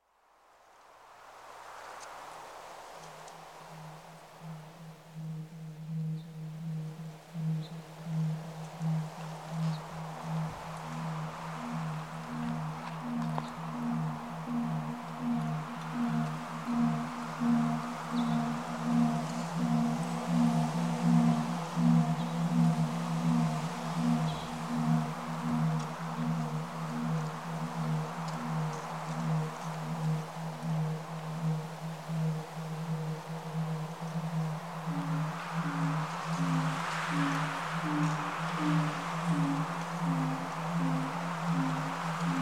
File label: cricket